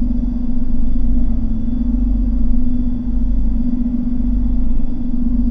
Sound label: teleport hum; The Swapper